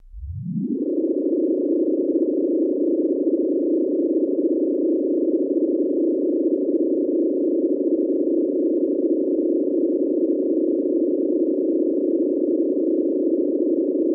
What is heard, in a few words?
Turbo Elevator Int, elevators